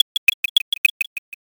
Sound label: ticktock